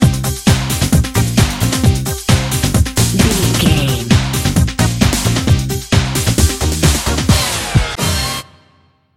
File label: got dance bumper, Dance, EDM, Club, Exercise, Aerobic, Aerobics, Spinning, Workout, Disco, Eurodance, Confident, Energetic, Cheerful